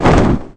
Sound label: woosh